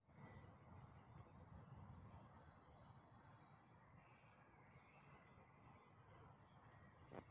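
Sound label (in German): Dawn Chorus, Vogelgesänge vor Sonnenaufgang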